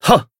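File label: Voice attack, Japanese; shion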